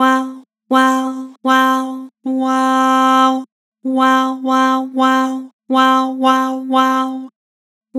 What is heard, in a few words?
Flat Wow, Vocals; Harmor Vocals